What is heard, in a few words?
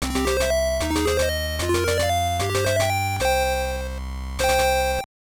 Press Start, gamerip; Tsume Shougi Hyakuban Shoubu; GB